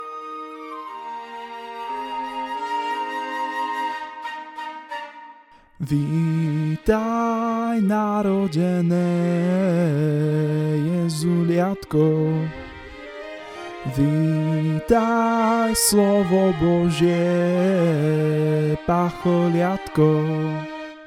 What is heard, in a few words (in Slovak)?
Sopran; Vitaj